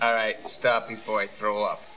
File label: throw up